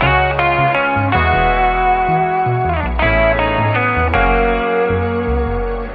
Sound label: guitar whistle, loops; Phonky